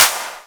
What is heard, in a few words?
Clap Open; samples